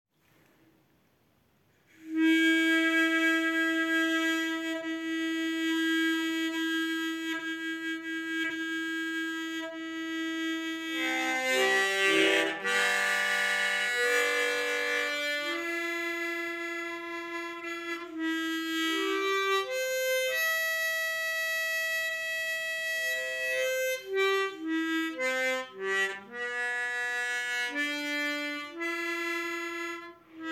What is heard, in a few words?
Echo Harp